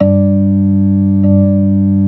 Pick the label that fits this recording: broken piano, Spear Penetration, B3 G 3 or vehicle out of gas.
B3 G 3